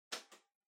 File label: Portable Stereo Far Tape Stop